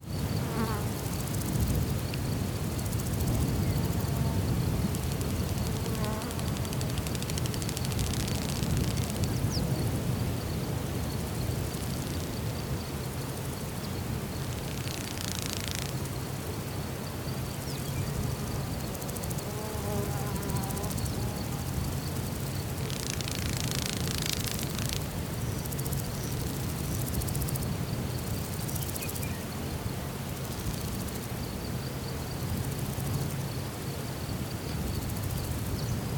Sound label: ambience countryside insects birds texas usa; felix blume